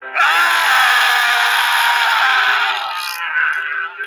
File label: diarrhea jumpscare